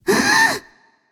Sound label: breath; smite; voicepack; scylla